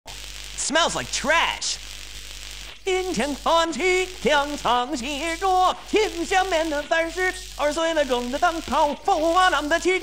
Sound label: sings more chinese; sonic